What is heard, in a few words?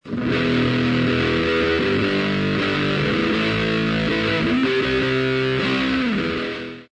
rythm; kranch